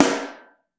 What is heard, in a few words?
SN Mono, Snare